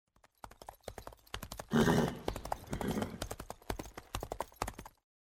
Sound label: Horse; Age of Empires III